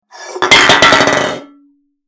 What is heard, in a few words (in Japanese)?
空の缶が転がる音